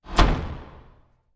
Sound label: Swell To Impact Distorted; bottlerocket